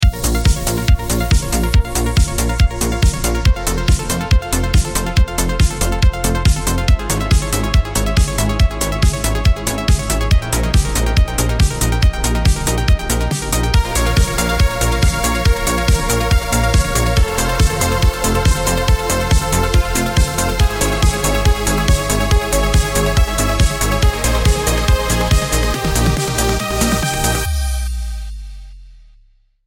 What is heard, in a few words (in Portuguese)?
fundo